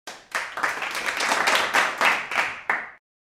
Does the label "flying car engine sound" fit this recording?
No